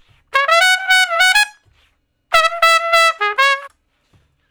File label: Trumpet Shuffle (Db); Crimson Blue and Fabulous Horncraft 4 RnB; Horncraft for R&B; Solos